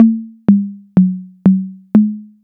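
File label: TR-808 low conga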